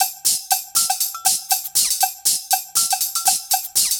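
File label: drum loop 120; House; Best Service ProSamples vol.10 - House; AKAI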